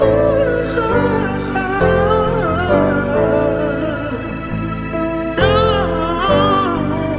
voice and piano dark funk; Loops; Phonky

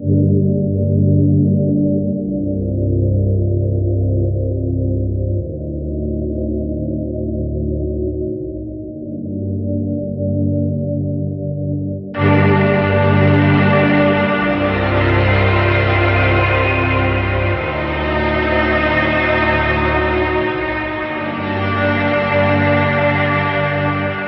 strings, Sad

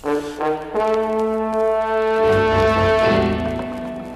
tension horns

